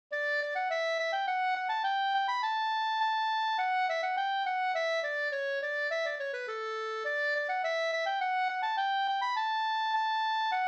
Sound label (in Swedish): slangpolska